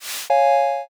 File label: world move